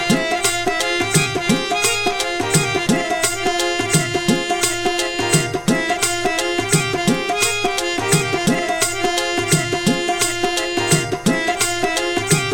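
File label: Punjabi sarangi harmonium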